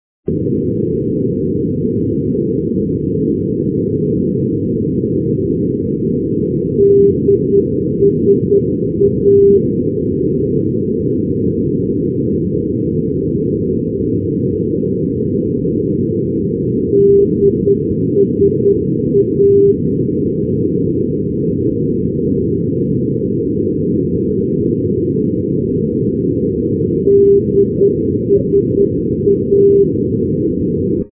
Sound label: ndb dieppe st aubin dsa 351; paris; europe